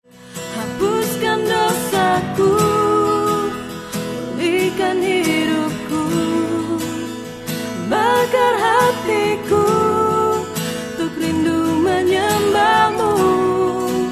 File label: bonus song